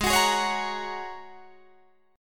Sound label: Ab 7th Suspended 2nd Suspended 4th, Guitar, DADGAD